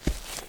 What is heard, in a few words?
fs grass, singlesteps